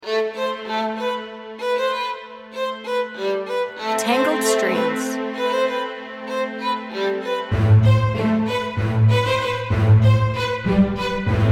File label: Tangled Strings